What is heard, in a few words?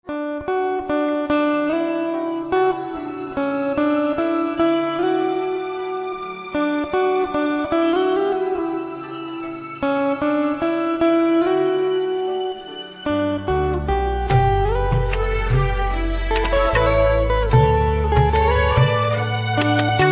Guitar